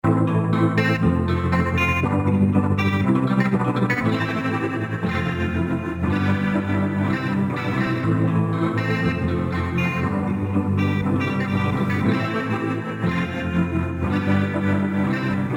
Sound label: Guitar Loop Exponential Space; Portal